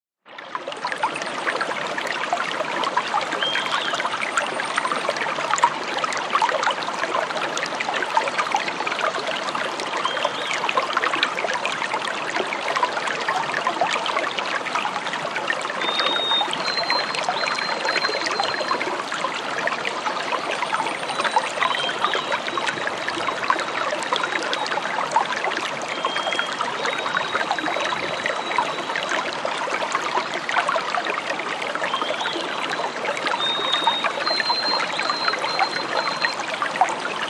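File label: Brook Medium Distance Close Up Water, streams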